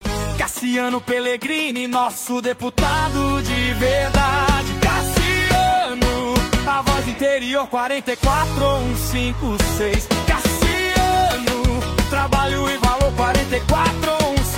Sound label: jingle